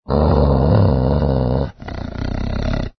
ANIMAL DOG

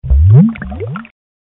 large bubble

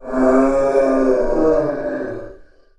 zombie demon spawn

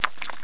cartoon

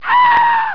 people

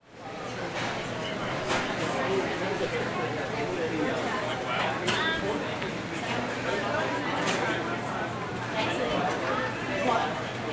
Mall Food Court

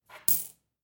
Coins Collecting

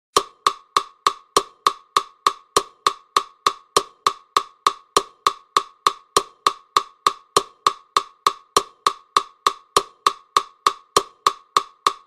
200 bpm metronom

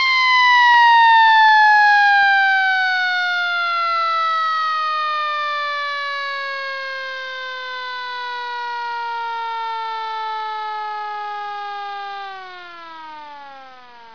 emergency

